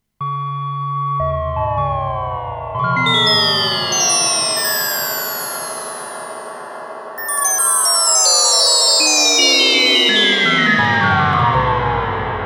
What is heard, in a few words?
Bells